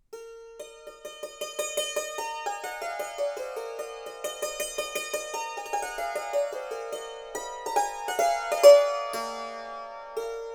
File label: battuto snakewood hammers